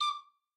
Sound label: flute; easter egg; golden tavern